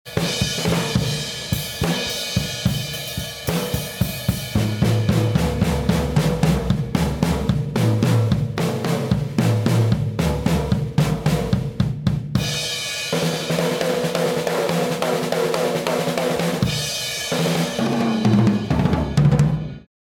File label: Distant Room Mics